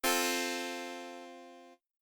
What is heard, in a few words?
arpeggio no